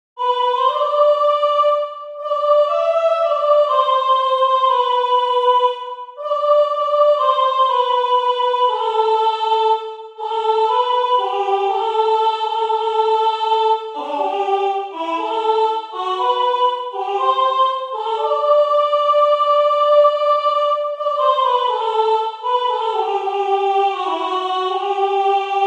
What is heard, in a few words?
Soprano; COME AGAIN; JOHN DOWLAND